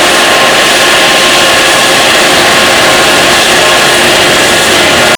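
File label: idle out, helicopter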